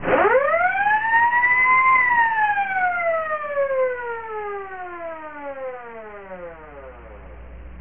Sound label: siren